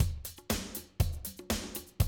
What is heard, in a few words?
120, Classic Drum Loops